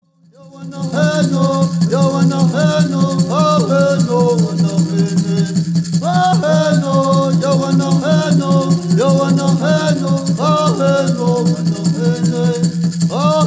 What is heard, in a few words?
Straight Song